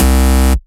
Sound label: Bass Cuts; Vengeance Samples; VES1 Instruments